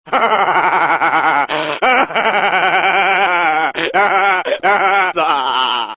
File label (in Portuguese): maniaco rindo